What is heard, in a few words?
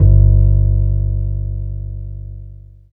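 bass G1; East West - Phat & Phunky CD2; 90's Sample CDs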